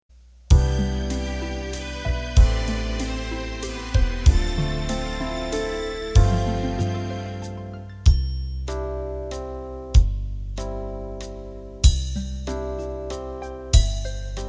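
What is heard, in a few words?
Slow Waltz, Roland accordion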